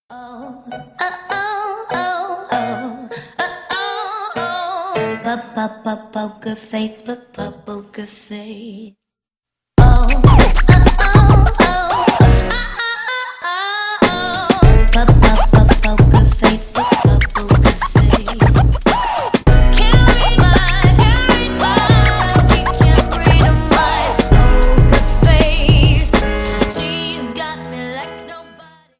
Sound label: instrumental